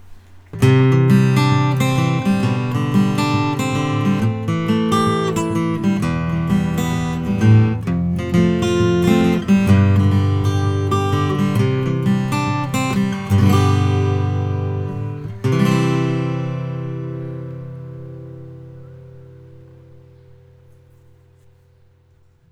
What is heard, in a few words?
collings 0001 mh; natural